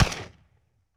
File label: Benelli 12 Gauge Shotgun Blast; Sony Pictures Sound Effects Series Volumes 1-10; Sony Creative Software